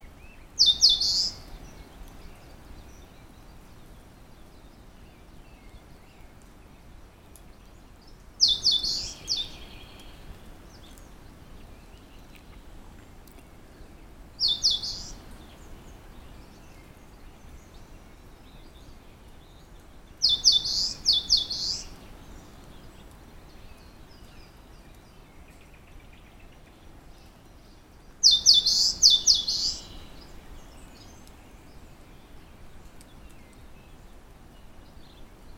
warbler eastern crowned Phylloscopus coronatus

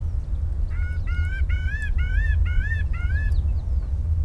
parrotbill reed Paradoxornis heudei

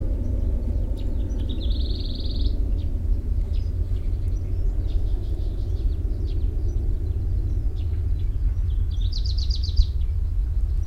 warbler grey crowned Seicercus tephrocephalus

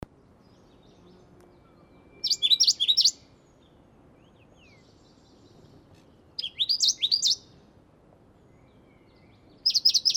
warbler martens Seicercus omeiensis

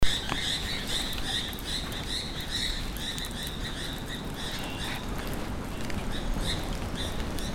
laughingthrush striated Garrulax striatus

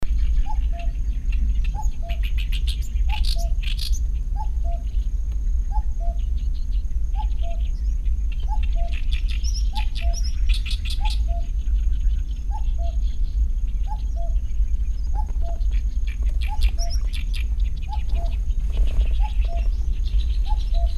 cuckoo common Cuculus canorus

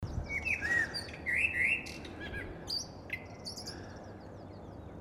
thrush sichuan Zoothera griseiceps

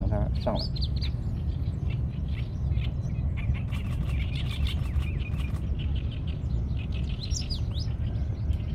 warbler alstrom Seicercus soror

